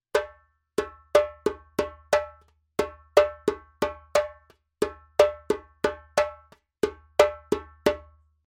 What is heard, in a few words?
djembe; djembebog oevelse